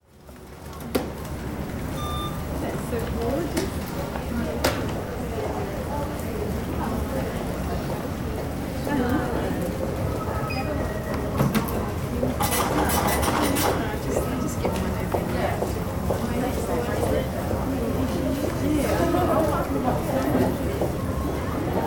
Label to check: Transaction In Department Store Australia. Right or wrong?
Right